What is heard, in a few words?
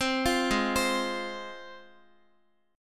G sharp 6th G sharp down Guitar, G#6 chord; arpeggiated